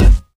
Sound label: physics cube